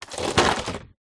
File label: food plastic meringue case packet set down; zapsplat